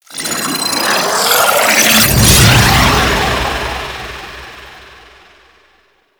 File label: Prism Cast; Warframe; Harlequin